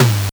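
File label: Game Boy Perc, DRUMS; ChipShop Deluxe; ChipShop Hits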